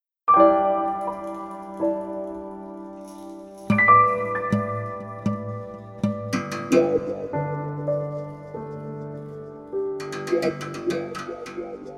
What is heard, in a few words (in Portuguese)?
Berimbau Ao Vento